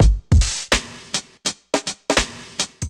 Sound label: Kit 83 bpm, Drums, Basic; MIDI Clips; Around The Corner; Ableton; Factory Packs; Chop and Swing